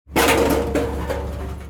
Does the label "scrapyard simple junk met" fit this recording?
Yes